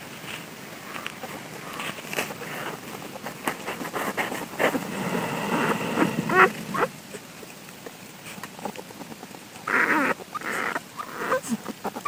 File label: cubs mother snores grunt